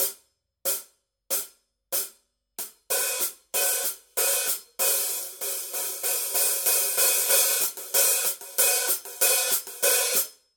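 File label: signature precision 14 sound edge hi-hat pattern, cymbales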